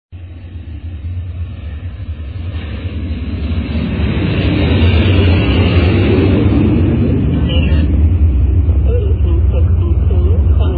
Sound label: takeoff sound ringtone